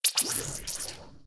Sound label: death squirt; smite; chaac